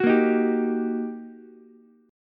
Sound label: G Minor Major 7th Sharp 5th, down, Ukulele; GmM7#5 strummed; JGuitar